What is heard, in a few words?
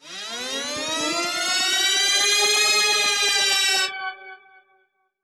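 Modulation Processed, alarm siren samples 125bpm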